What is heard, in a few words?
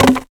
wood falling log; sonniss gdc